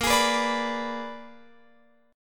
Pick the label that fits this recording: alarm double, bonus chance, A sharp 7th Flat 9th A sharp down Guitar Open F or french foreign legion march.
A sharp 7th Flat 9th A sharp down Guitar Open F